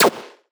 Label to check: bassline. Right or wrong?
Wrong